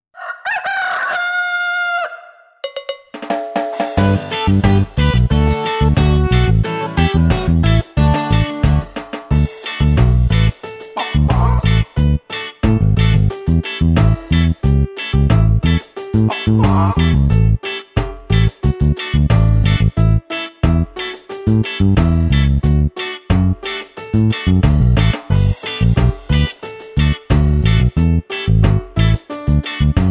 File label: Karaoke